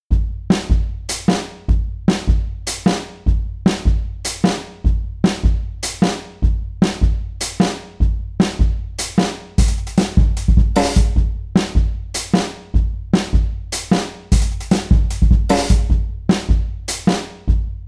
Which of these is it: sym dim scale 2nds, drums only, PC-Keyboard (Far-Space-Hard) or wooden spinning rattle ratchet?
drums only